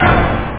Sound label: do hit; 8,363 sample rate; 0.08 seconds